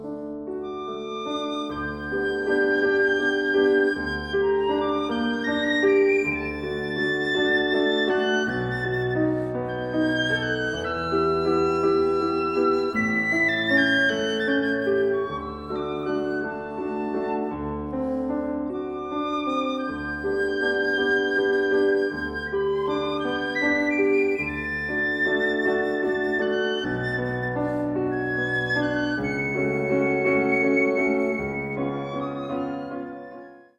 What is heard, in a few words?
piccolo